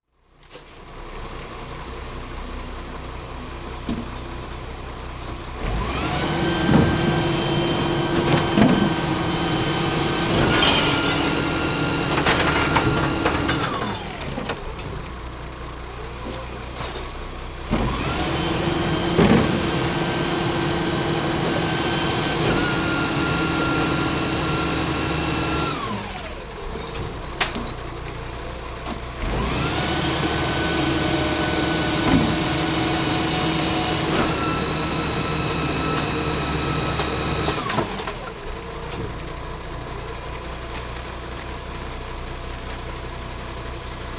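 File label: garbage truck collecting garbage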